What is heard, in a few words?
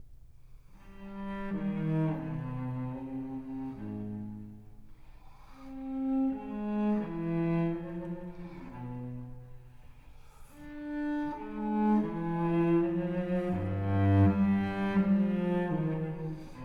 Sarabande; BWV1011 Suite No.5 in C minor